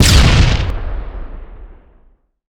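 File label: grenade explode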